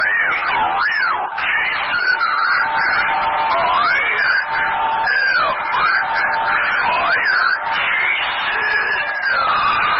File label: fire earrape; jason